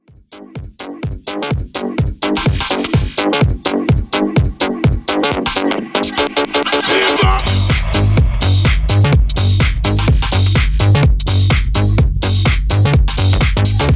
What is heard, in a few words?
Bass House 126Bpm